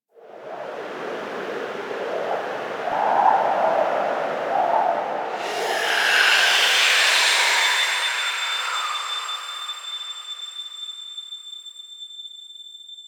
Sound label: SFX Choice Stinger; Warframe; The War Within; Mountain Pass Return Peak; Cinematics